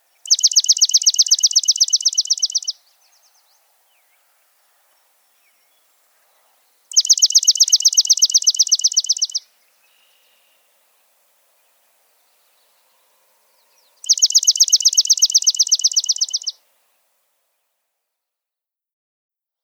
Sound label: Swamp Sparrow